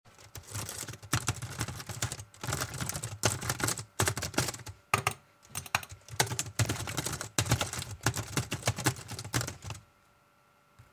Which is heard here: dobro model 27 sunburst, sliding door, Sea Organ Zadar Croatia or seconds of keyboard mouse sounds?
seconds of keyboard mouse sounds